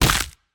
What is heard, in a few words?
Player Attack Hit Blood; Pulling No Punches